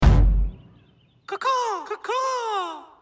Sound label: Caw Caw; Lego